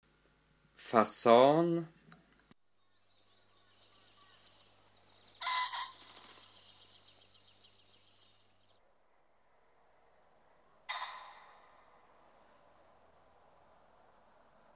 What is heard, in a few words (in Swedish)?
Fasan